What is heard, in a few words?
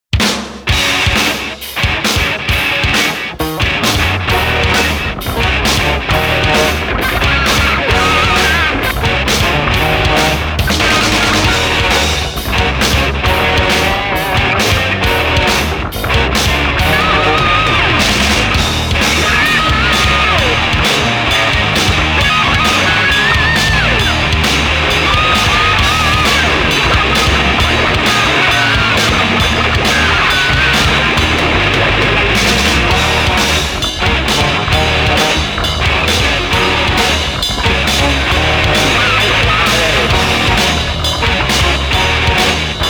Funky Noise, Thrash & Groove